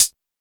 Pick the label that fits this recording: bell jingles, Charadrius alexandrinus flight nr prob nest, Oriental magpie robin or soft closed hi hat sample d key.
soft closed hi hat sample d key